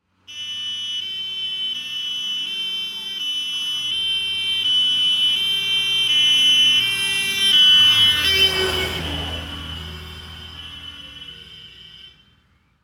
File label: fire engine siren german